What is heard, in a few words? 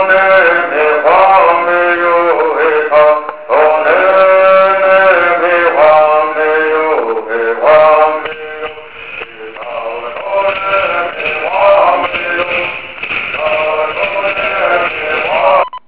Bird